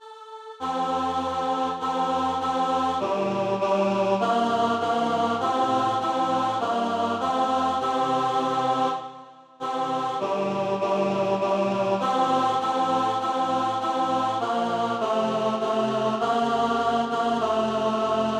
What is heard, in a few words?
Alto; Gibt mir nicht Glanz